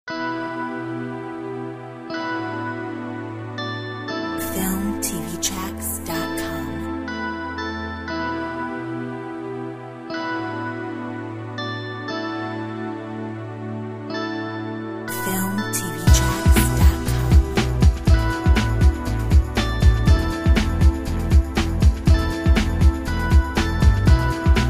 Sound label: Corporate force